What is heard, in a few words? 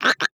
Animal Frog California Tree Frog Chirp; animals; AdobeCC